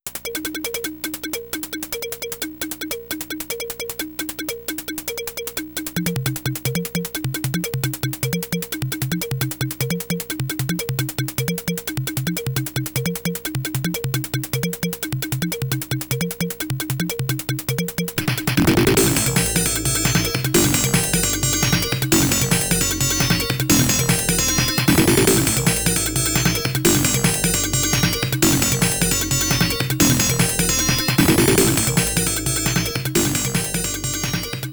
Carnival Stage Bonus BGM

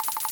Kiosk Cashreg Counter Roll; Zombie Catchers

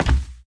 Footsteps

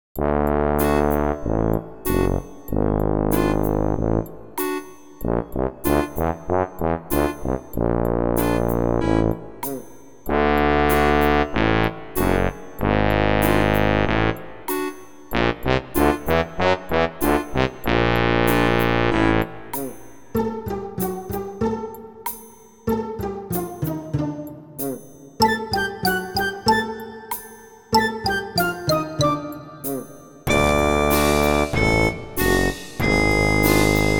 Foolish Tuba

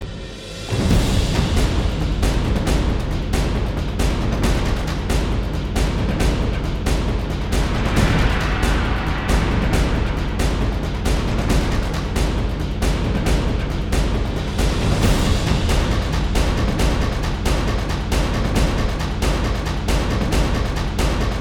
Combat Short Percussive

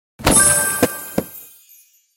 Gift Open; Candy Crush Soda; Treasureroad

